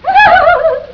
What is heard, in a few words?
Laugh; awful truth